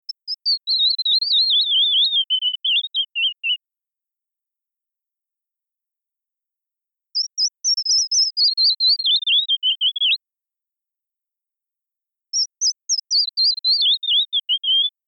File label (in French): pouillot